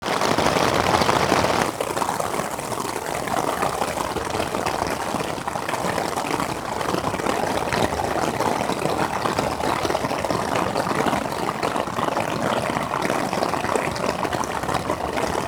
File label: tent rain